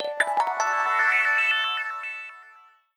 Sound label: Unfold, notifications; Motorola Moto G